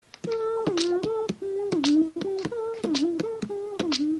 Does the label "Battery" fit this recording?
No